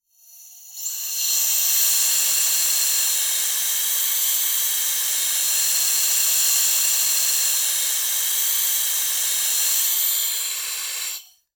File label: Extermination, Bug, Bomb, Release, Pesticide, Insect; Explosions; Bombs; Blastwave